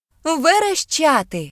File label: scream; gcompris-data